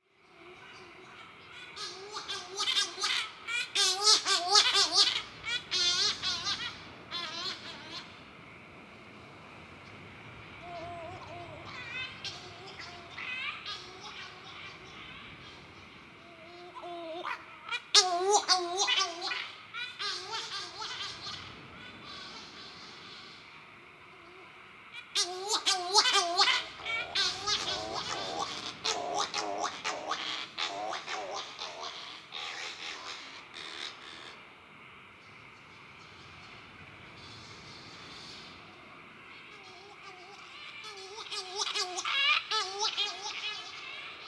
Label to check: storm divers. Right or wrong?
Right